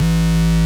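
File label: DISTORTED SYNTH A-1; AKAI S-Series CD-ROM Sound Library VOL-7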